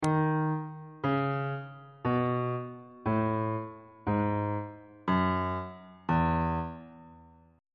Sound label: f flat major scale descending on bass clef